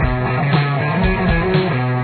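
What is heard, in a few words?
pre-chorus guitar, This riff has fast 16th notes; The ghost note in the tab at the very beginning should be played the very first time.; drop D tuning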